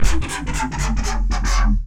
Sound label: big riddim; ultimate vital bundle; rocket powered sound